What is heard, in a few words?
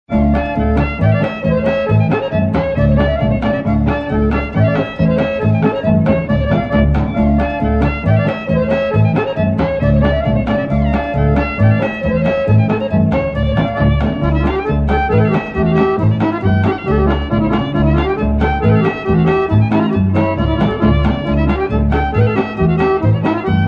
Knickerbocker Polka